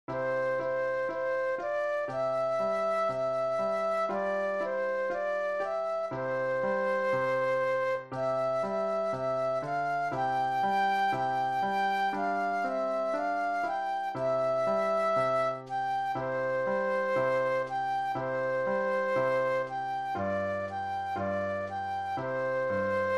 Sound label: flute solo